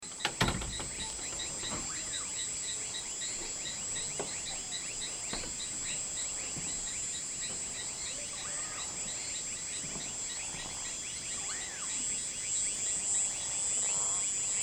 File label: Chorus